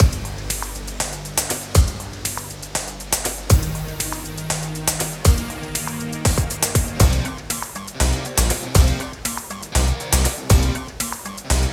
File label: River (With Rain); Wario Land: Shake It!; Soggybog